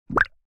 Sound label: Cartoon bubble